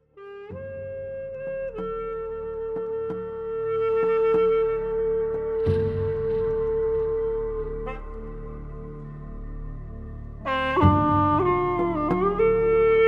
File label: bawu